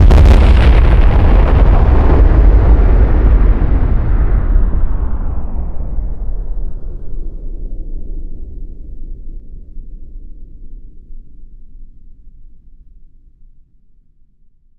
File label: Smartbomb Plasma; evil-inside